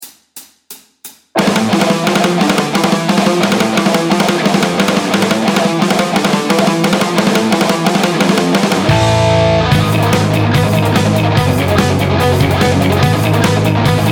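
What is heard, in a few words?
Hammer On, Metal Guitar Exercise Gallop Picking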